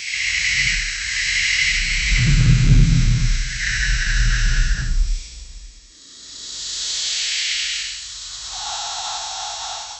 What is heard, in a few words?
sound of touching demonic, wings